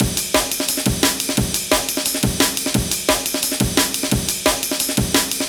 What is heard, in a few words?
Amen EQ 175, classic dnb breaks